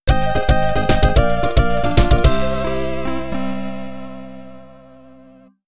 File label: STAGE CLEAR (YM2608); New City Hero